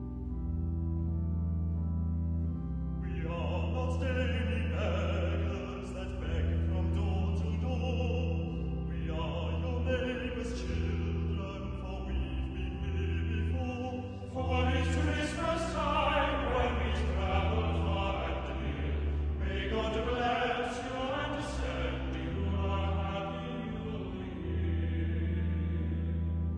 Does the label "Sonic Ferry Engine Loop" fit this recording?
No